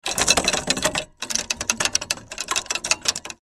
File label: Rusty gears mechanical rattle